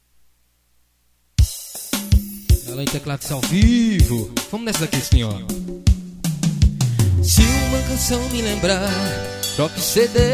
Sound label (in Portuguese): vanerao